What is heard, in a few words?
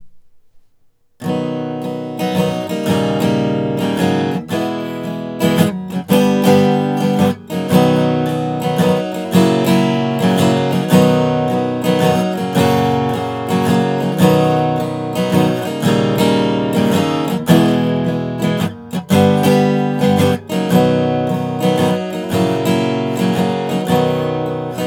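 strum right, hsguitar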